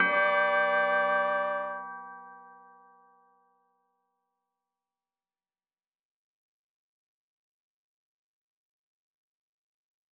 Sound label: ingame generic 4th Layer; wicked witches